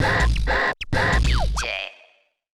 Startup; Ejay Mp3 Easy V1.0

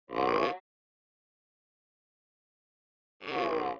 periscope

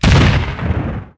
explosion

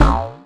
Banana Impact; Worms3D

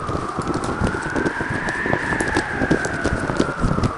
WHOOSH; SUPERSHEEP; Worms3D

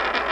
roll wood

